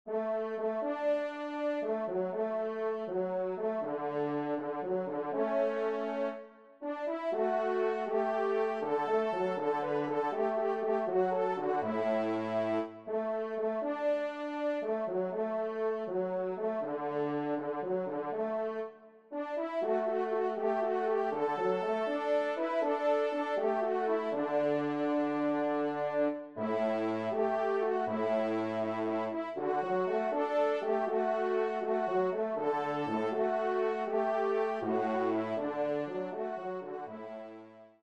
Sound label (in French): trompe; La Cuenol; 3e Trompe